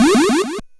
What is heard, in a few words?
Drums, Hits; DCM8